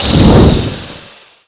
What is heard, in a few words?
unstable concoction throw; weapons; dota; alchemist